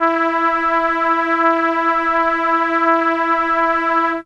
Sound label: Horn E4, ARP Solina; musicradar-synth-samples